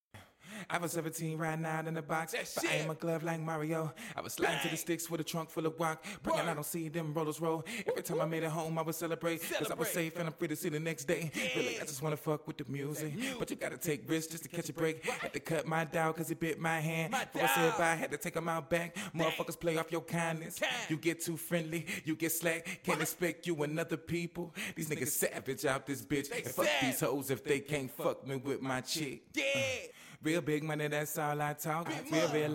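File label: hip hop, 98, A minor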